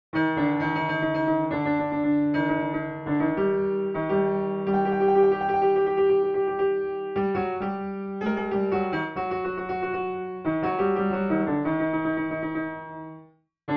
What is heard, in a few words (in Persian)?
Chaharmezrab, piano; Chahargah; Radif Mahjoubi